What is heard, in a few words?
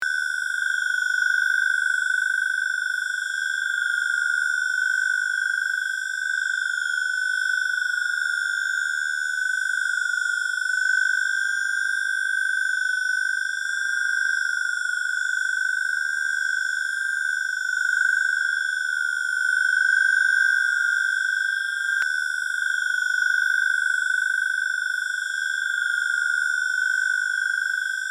tractor beam